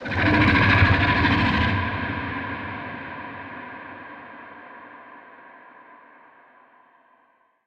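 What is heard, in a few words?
ambience unknown creatures big snake; Sfx amb unknowncreatures bigsnake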